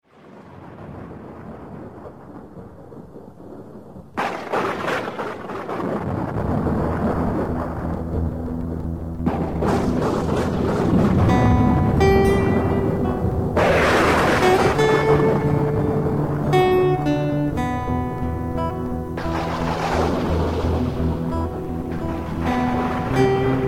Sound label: acusticguitar